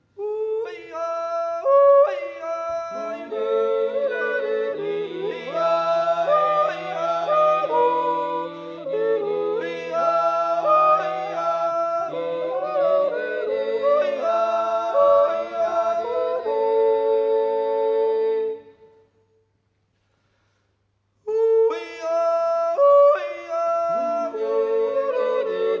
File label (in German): Naturstimmen